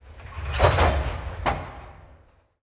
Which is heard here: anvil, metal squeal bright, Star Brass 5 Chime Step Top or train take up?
train take up